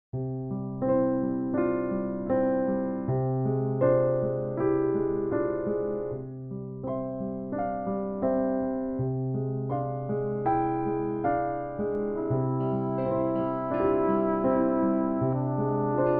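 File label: play piano reference flugelhorn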